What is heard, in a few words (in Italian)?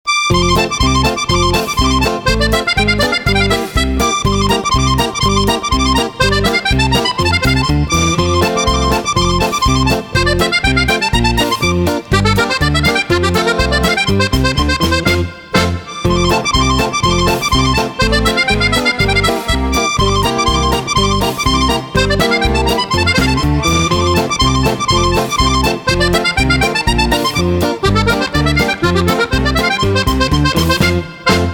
suona la fisarmonica